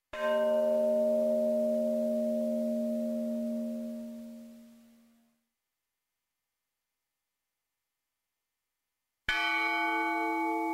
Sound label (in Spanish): Campanas